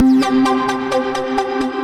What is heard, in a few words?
Synth future house bass